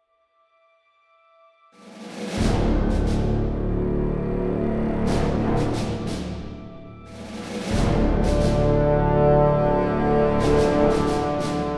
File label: Starfighter Music; Galatic Starfighter